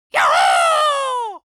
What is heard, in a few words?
creature dwarf cheer juhu